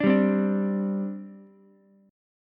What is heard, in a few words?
G Suspended 4th, Ukulele; strummed; JGuitar